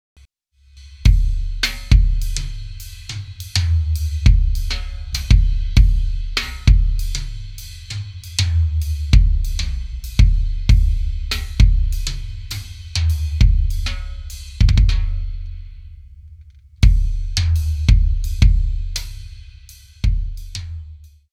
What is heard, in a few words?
Kick with TB550A